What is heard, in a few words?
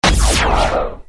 matrix sms